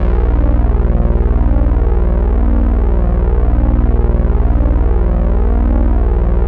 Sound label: ROLAND JX3P, EB1; Big Fish Audio - First Call Vintage Keyboards